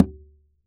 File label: AMB, Congas